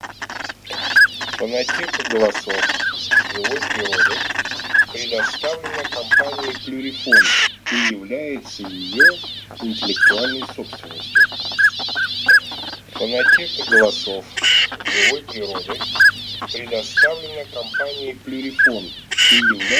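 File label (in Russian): Шилохвость Codone mix, манок; plurifon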